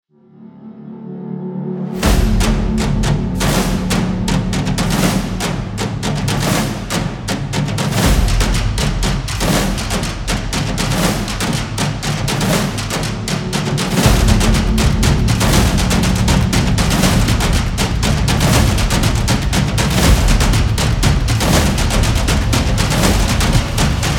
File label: Cinema Drums; intense drum beats